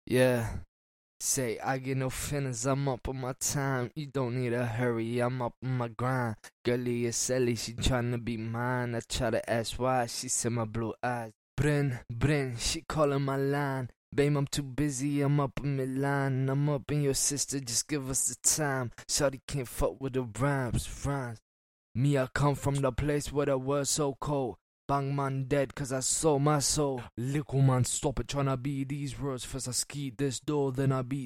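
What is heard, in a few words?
HH C sharp minor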